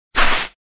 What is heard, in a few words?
step long grass; Super Mario 63